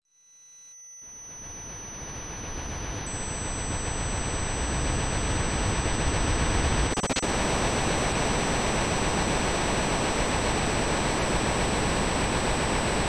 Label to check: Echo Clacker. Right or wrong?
Wrong